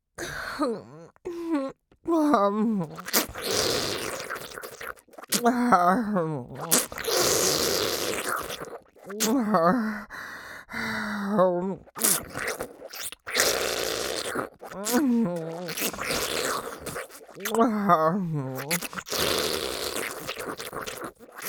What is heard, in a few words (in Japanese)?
チン嗅ぎと裏筋舐め上げ ちゅぱ音, ASMR